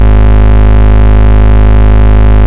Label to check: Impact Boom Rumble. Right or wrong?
Wrong